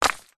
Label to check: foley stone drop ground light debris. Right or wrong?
Right